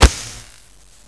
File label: slap knife hit wall